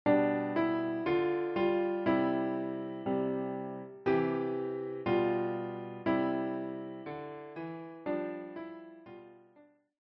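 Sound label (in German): Summ summ summ, Klavier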